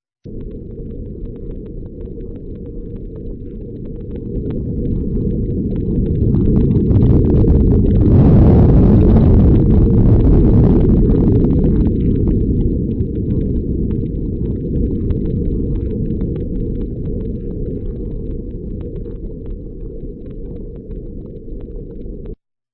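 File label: Astoria Canyon earthquake; NOAA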